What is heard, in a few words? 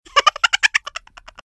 Happy Giggle; F.A.M.P.S